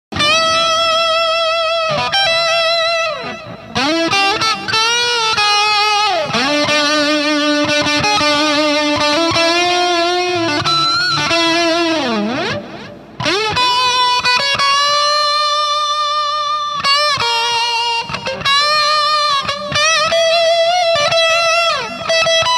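Cornish G-2, Hiwatt, Eclipse Cab, delay, No backing track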